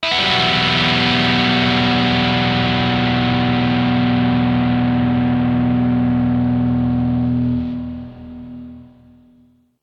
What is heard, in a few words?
dirtygtr stereo clone theory